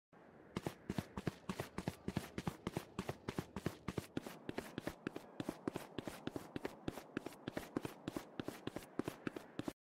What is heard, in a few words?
Footsteps Running